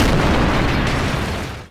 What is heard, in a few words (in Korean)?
[Hit] 폭; 音MAD; 少女终末旅行; 소녀 종말 여행 음원팩